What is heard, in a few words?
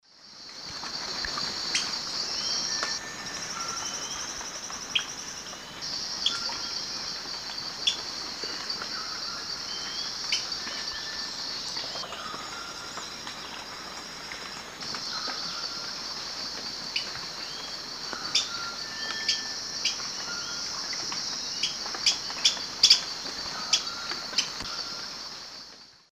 Sclateria naevia, calls female; S. America; Eastern Lowlands